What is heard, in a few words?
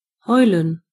howl; gcompris